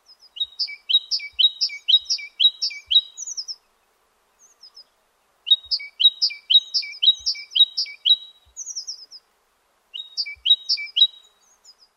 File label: great tit